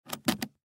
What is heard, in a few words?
Make Up Latch Open, Make Up Latch Open Single Box; Latches; Foley; Sound Effects:Objects; SoundEffects-Blastwave